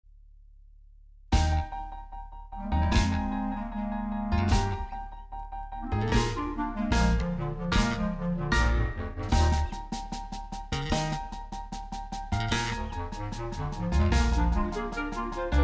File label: accomp